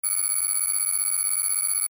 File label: title running layer signal